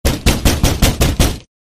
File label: door banging once